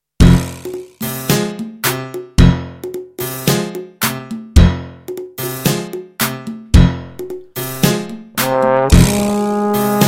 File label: Spanish Tango